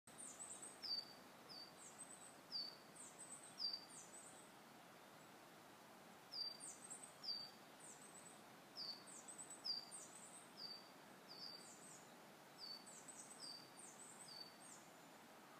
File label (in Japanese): kogara